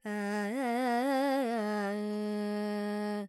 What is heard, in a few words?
Major, Carnatic Female, Alaps, Sankarabharanam, Dry; Vocals; Sample Banks